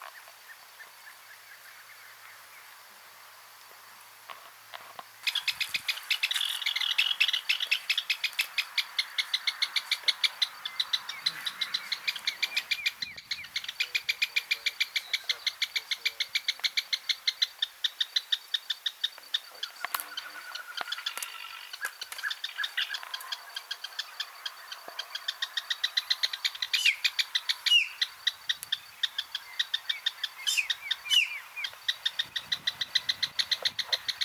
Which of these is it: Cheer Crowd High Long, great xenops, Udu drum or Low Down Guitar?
great xenops